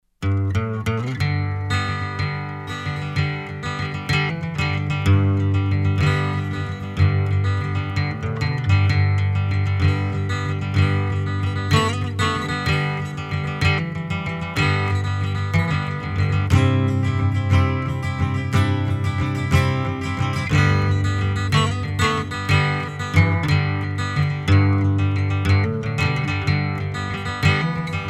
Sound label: acoustic resist remix